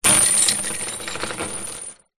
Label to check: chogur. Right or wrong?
Wrong